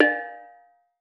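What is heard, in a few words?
BONANG D3, Metalophones, Pitched Percussion; E-MU Producer Series Vol. 7 – Old World Instruments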